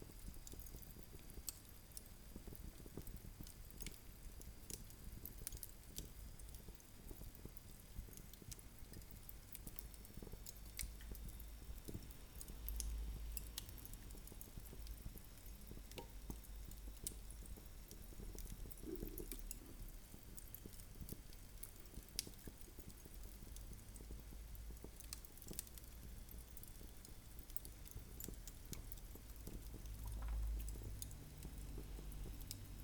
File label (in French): feu; l'image et le sonore